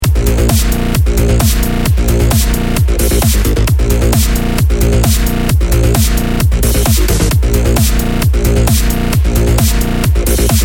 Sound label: background